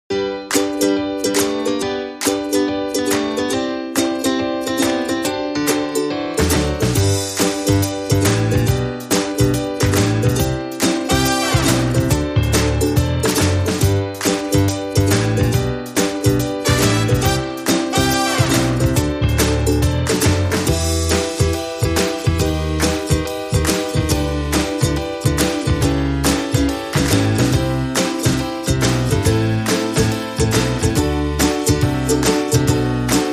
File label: No Organ